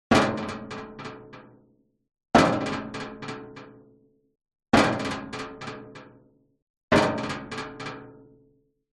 impacts metal rattle on rusty old bulldozer various contact mic